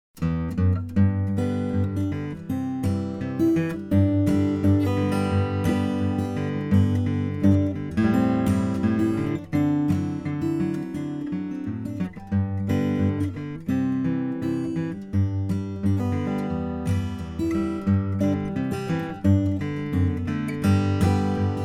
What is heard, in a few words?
new folk tune